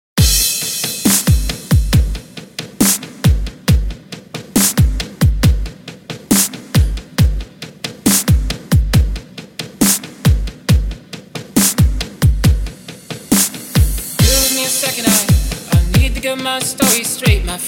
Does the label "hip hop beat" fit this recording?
Yes